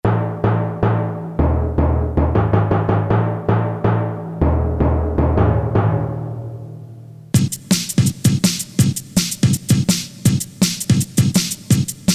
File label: TYMPANI; Korg DSS-1; Combination Snds